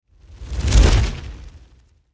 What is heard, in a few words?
Fireball Catapult, Fire Catapult Whoosh Pass By Heavy; Flames; Sound Design; Flame Sound Effects